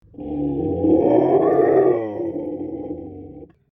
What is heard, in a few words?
roar viking